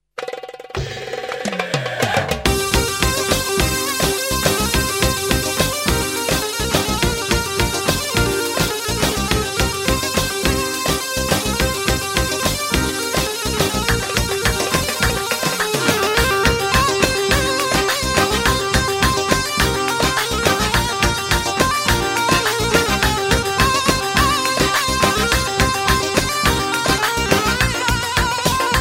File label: Dabke